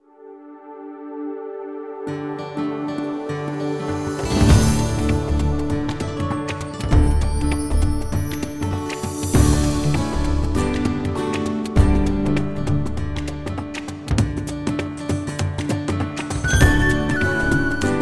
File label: Celtic Spring